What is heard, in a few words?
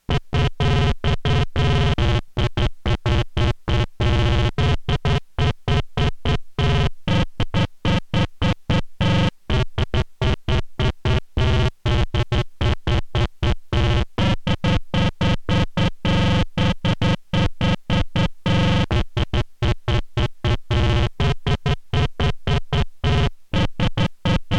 Korg Monotron Duo